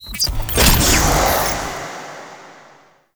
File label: Data Collect; Warframe; Wraith Quest